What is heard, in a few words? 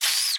Rat Coming